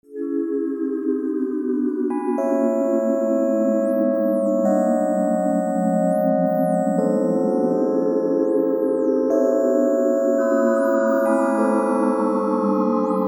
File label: xmas free jazz